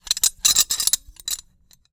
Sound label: metal barrel bolt lock loose handling; sonniss gdc